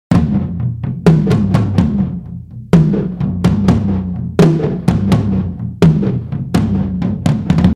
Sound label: 126 Canyon Tom Sizzler loop